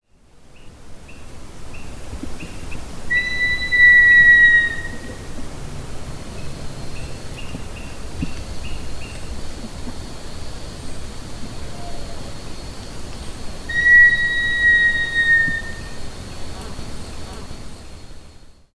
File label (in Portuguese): Urubitinga urubitinga, Gavião preto; Macaé.RJ; Fazenda Pau Ferro